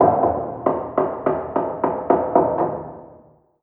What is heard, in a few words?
Random Single Hammer